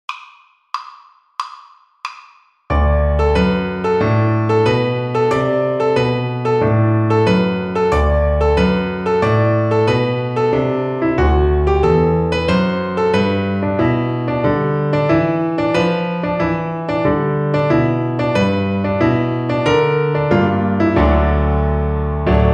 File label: String Gym, Piano Accompaniments; Etude 11 - String Crossing Blues #2